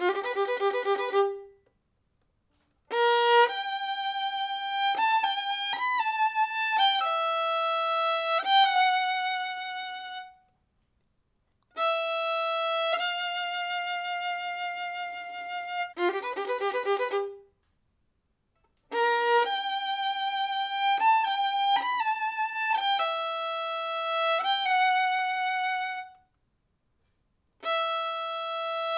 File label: violin